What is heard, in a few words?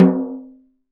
Snare Drum; Percussion Samples